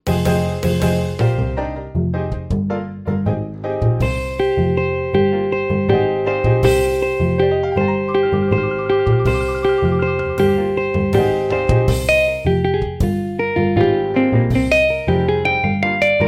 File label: Jazz Electric Guitar Smooth; GuitarTempus; Syntheway